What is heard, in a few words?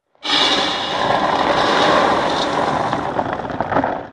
cave in